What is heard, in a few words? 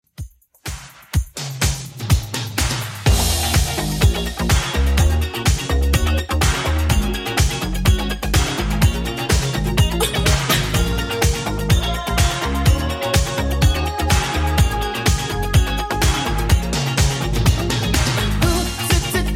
80s Redrum 125bpm